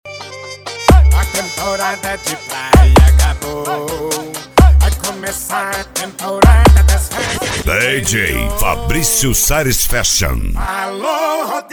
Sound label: funk mega bass